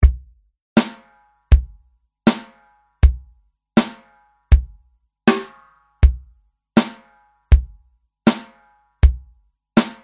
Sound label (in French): Rythme Tempo 80